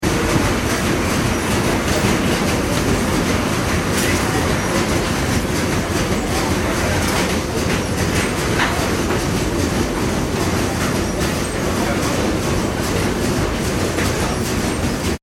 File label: ambience airport la rochelle baggage reclaim; Airport Ambience - Baggage Reclaim ( Small Room ) - La Rochelle Airport; Airports; Backgrounds; McKinney Sound